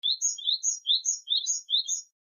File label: bird